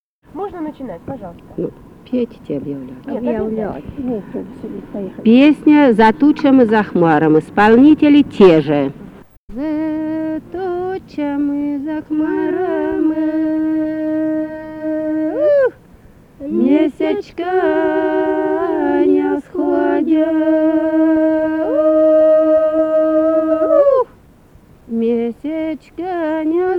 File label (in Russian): За тучами, за хмарами (жнивная); Лобановка